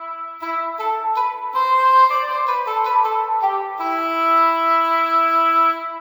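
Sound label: English Horn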